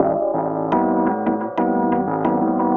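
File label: KEYS loop L, Drum & Bass 175 BPM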